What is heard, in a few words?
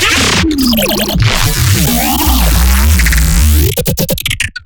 glitch stab; Virtual Riot; Glitch Stabs